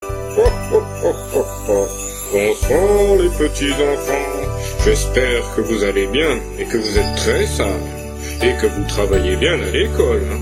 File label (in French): Bruitages Noël